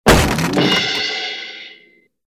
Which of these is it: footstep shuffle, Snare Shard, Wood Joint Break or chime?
Wood Joint Break